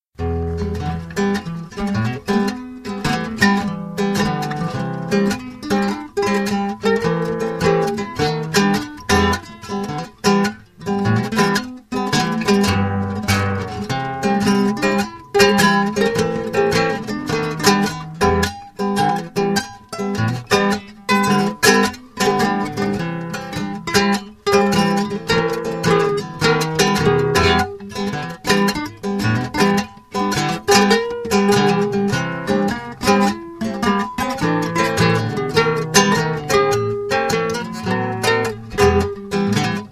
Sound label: Classical Jam